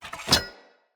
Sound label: Sphere Polearm Unequip, Weapons; Player